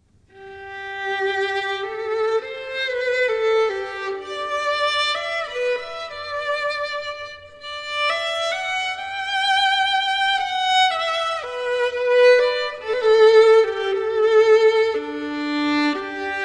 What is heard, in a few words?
Violin; Intermezzo